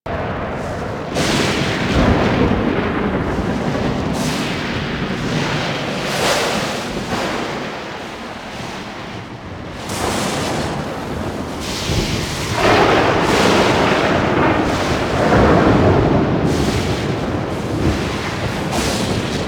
Lightning Storm Loop; Ambience

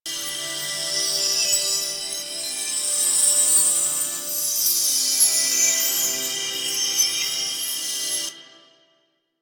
Shawzin Prop Loop; Duviri

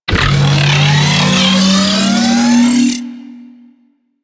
Call Backup Charge; Enemies; Abilities; Warframe; Corpus; Arachnoid Camper Terra

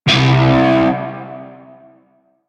Shawzin Flourish Oct One C; Music; Warframe; Narmer Shawzin

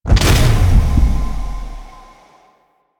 Friendly Grineer Revive Complete; Warframe; Veilbreaker; Quests; Kahl

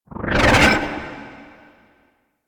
Aura Cast; Warframe; Animus; Anima Animus

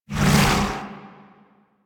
Slash Dash; Warframe; Warframes; Excalibur Umbra

